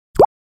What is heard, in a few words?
Drip Single Falls into Liquid; water